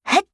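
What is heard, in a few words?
attack, Voice Line, Korean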